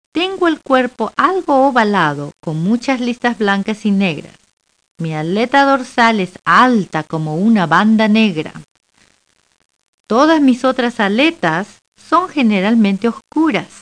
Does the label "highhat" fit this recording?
Yes